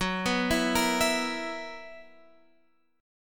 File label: B Major 7th Suspended 4th, guitar chord